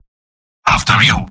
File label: battle cry; spy; mvm